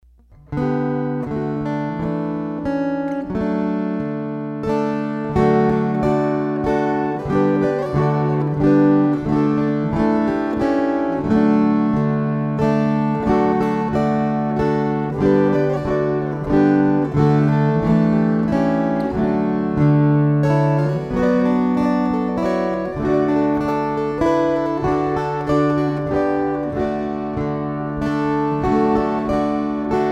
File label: String Choir